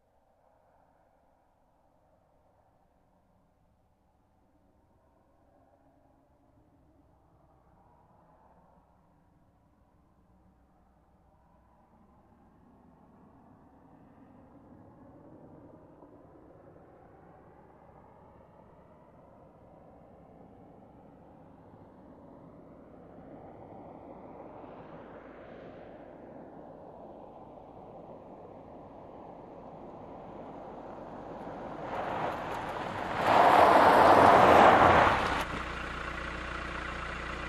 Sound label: Car approaching and parking